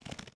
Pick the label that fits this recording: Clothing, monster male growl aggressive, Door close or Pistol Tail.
Clothing